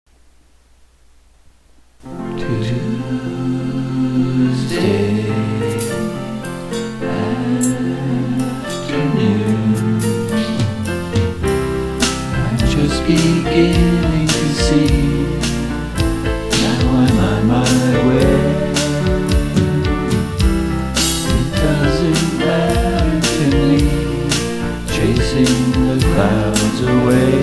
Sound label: sing